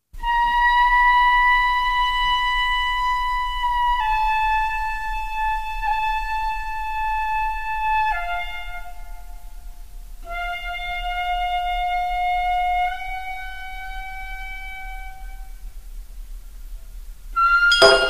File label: gagaku